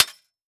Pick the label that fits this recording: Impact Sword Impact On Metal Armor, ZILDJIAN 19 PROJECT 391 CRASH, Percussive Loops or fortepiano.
Impact Sword Impact On Metal Armor